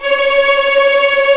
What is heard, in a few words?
C#5 TREM, VLNS TREMOLO, String; East West; The Ultimate String Collection